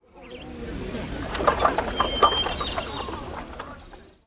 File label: city, Ambient; Zeus